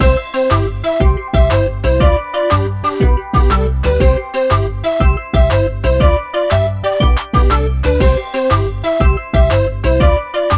fantasy, bgm; pictcode

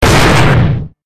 attack; pictcode

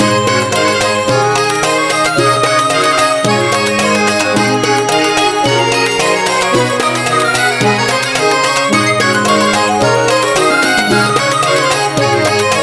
pop, bgm; pictcode

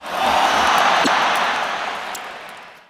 cheer; pictcode

